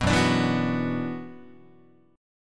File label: F sharp 7th Suspended 4th Sharp 5th, Guitar